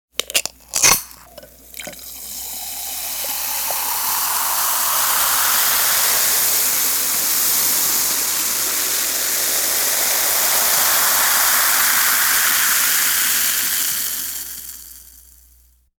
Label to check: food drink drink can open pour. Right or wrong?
Right